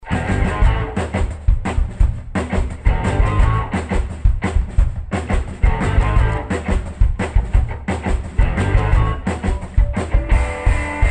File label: sensorineural mild